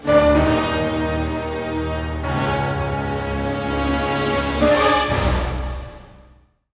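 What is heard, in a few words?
FULL ORCH, orchestra; East West; Symphonic Adventures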